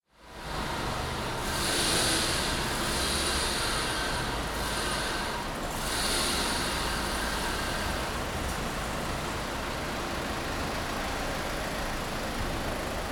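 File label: ambience, Shopping Mall Car Park; noise creations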